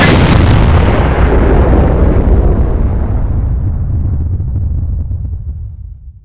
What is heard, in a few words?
leopard cannon; weapons